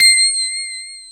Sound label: STONE BELL; Back In Time Records; Big Bang